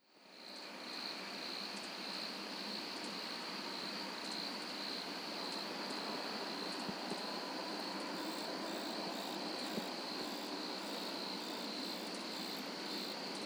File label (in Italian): Cicale